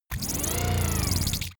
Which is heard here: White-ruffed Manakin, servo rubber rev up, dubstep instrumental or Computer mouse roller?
servo rubber rev up